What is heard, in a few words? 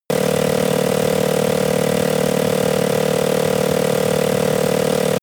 snowmobile engine slow idle steady